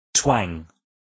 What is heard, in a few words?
twang